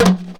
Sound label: JUNKU HITS; Best Service; World Colours